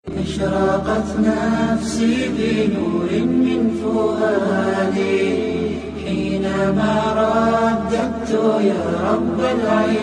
islamic song

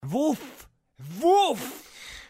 Woooof WOOOOOF

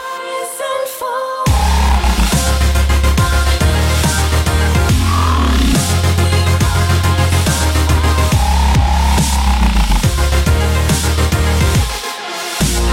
Instrumental

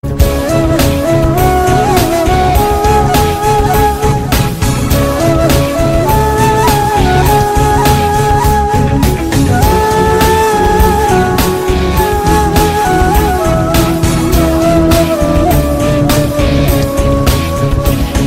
Dombra Ney

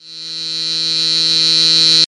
buzz, sms; ringtones

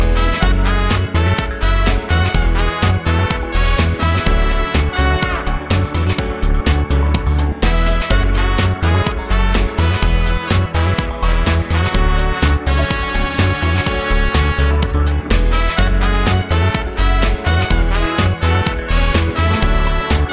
Is Calling RNB

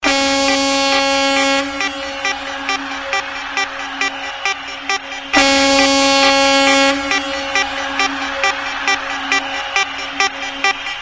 Alert horn

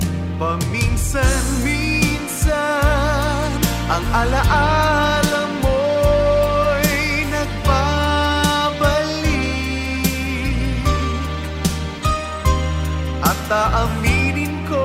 pinoy tone, rock

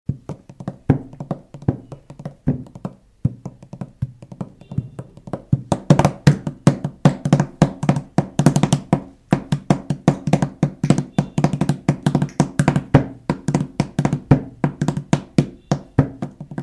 Desi Tabla music

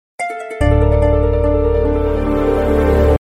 simple msg, sms; ringtones

